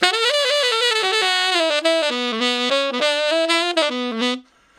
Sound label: Ten Sax Straight (B), R&B; Crimson Blue and Fabulous Horncraft 4 RnB; Solos